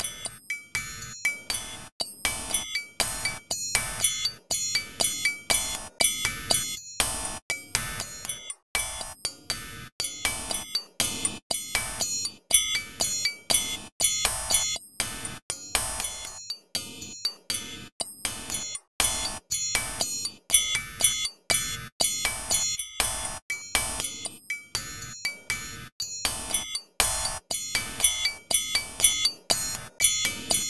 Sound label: Bellz, Percussion Combos, 120; M-Audio; Pro Sessions VOL-38; Abstract World Fusion 2